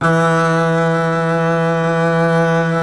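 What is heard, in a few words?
string contrabass E0; Miroslav Vitous - Solo Instruments VOL-2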